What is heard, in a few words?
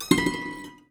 Prepared Piano; Battery 3 Sample Kits